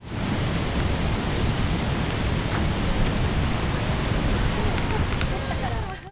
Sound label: kisekirei, bird song; キセキレイ; セキレイ科; 新潟県阿賀野市畑江 五頭山ふもと; J-eco 鳥のさえずり図鑑